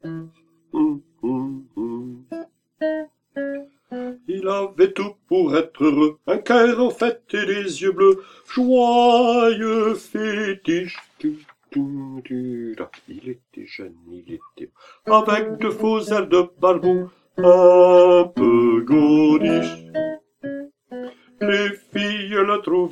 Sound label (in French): basse, chorale